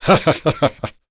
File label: Vox Laugh; Nicx; kingsraid